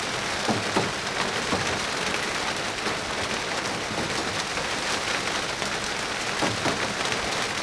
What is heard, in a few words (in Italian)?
Pioggia, natura